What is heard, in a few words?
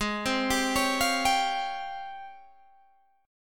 Ab Major 13th, Guitar, Open G